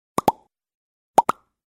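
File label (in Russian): чпок двойной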